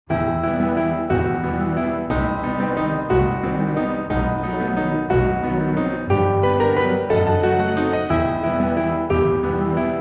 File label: theme waltz; Cris Tales; PS4 PS5 Stadia Switch Windows Xbox One Xbox Series X/S